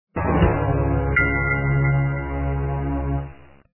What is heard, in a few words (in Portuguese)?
derrota; anacroz